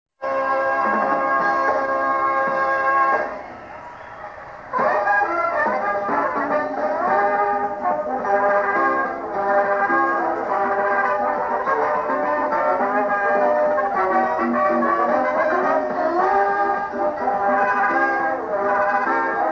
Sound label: circus double tongue